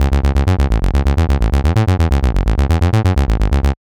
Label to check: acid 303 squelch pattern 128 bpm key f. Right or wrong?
Right